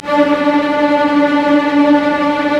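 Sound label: STR SEC, strings; STR ORC FX; Roland - LA Composer vol. 1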